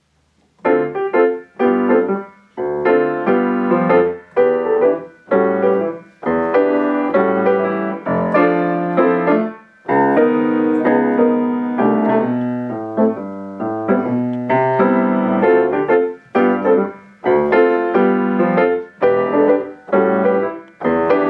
Romantic Pianist; Los Angeles

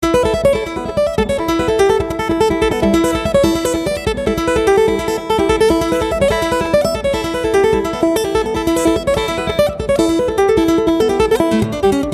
Jazz Guitarist; Ukraine

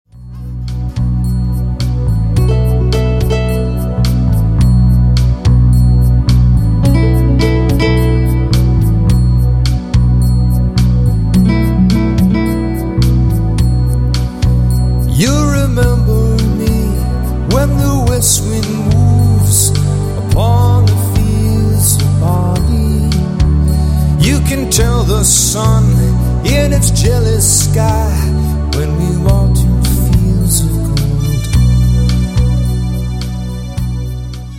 Party Band; Boston

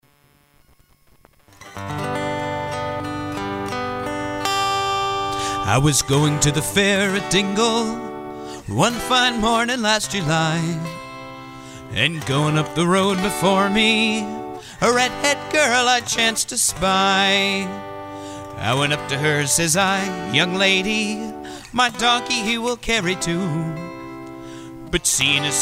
Irish Band; Washington DC; This Washington DC Irish Band has established itself as one of the best loved Irish bands in the Metro DC area.

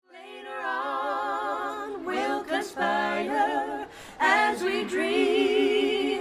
Carolers

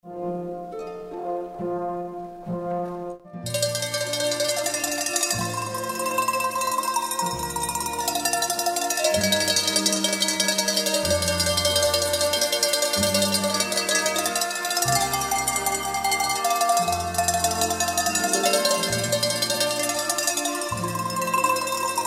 German Band; Chicago; This Chicago German Band is the newest European-American Band to hit the stage in the Chicagoland

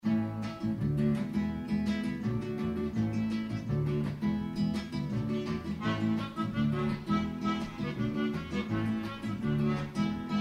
Mariachi

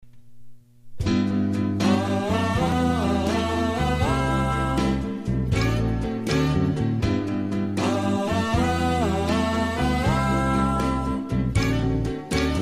50s 60s Band

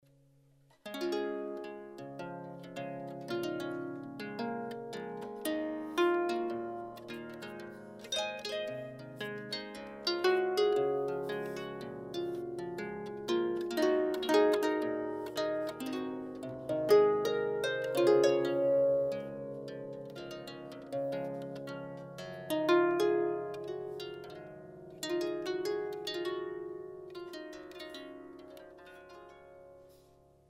Harpist; Orlando Harpist

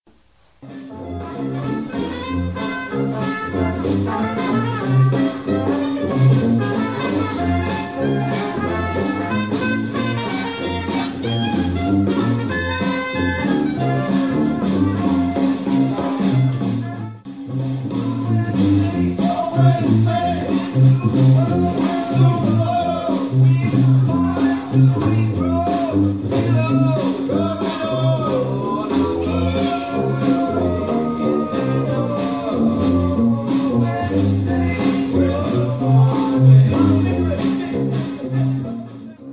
Wedding Band; New Orleans

